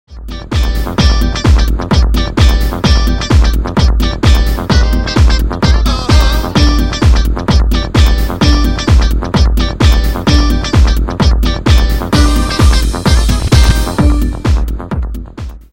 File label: msn mix sample, The MSN Messenger Mix!